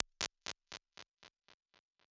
Textures Space Fattened Echo, impulse responses; Ableton Live 12 Lite; Hybrid

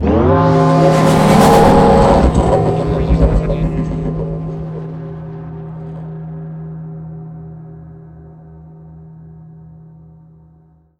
Guardians Blocked; Cave; Journey

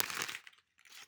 Textures Paper, impulse responses; Ableton Live 12 Lite; Hybrid

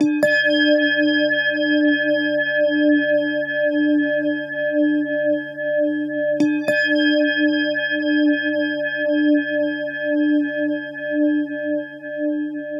Singing Bowl D; Loops; Texture; Ableton Live 12 Lite; Core Library

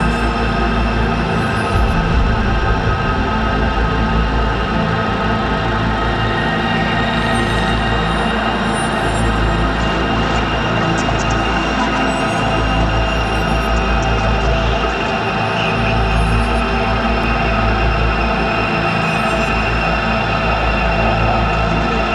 Texture; Ableton Live 12 Lite; Core Library

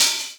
Hihat Open

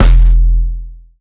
Kick; Ableton Live 12 Lite; Core Library; Drums

